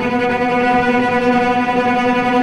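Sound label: tremolo, strings; East West - The Ultimate String Collection; VCS TREMOLO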